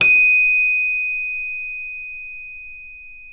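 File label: CELESTA F5, Synclavier Percussion; ILIO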